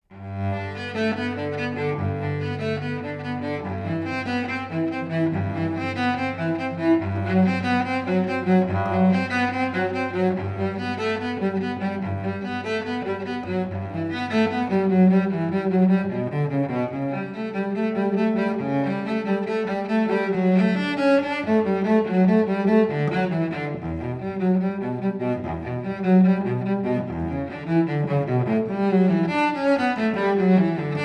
Transfer Of Weight Cello Suite; Cello Suite No.1 BWV

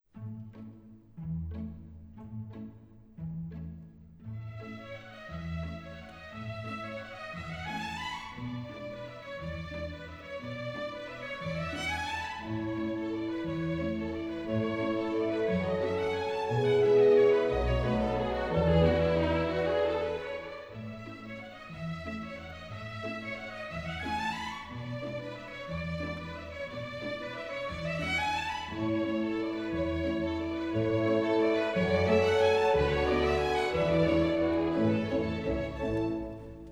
Warm-Up, Ballet Music

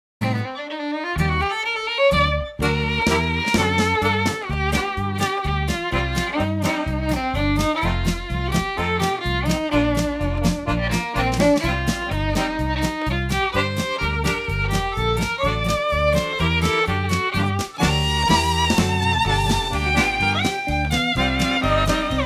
Centre Practice, exam music

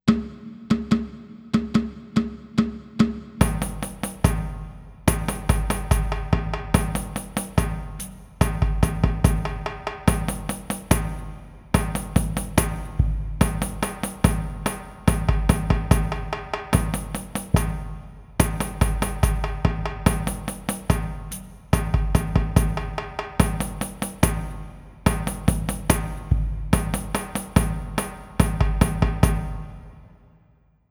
Walks Walking Drums

